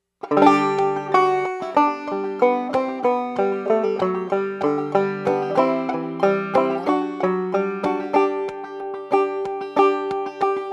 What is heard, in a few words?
fast; Christmas